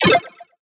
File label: Retro FX; Cymatics; Video Game Sample Pack Vol 1